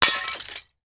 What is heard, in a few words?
impact glass window smash; sound effects one